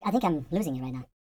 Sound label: YODEL YELL; ILIO; Vocal Planet VOL-5